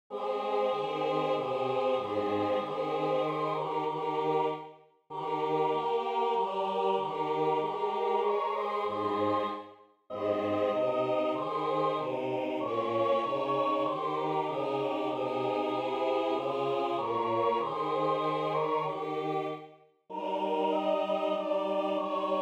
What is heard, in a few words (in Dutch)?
SATB; Piu non si trovano